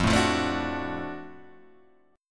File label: Gb Minor Major 7th Sharp 5th, chord